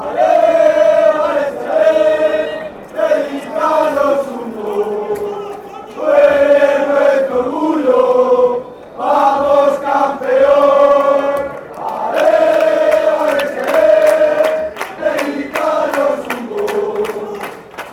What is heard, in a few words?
come on champ, chants